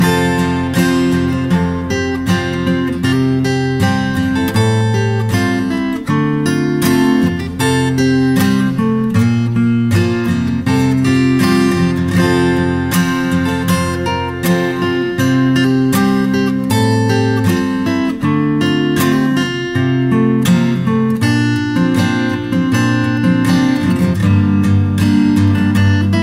instrum

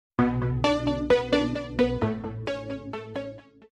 Sms

